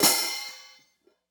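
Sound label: open hi-hat; eddyflux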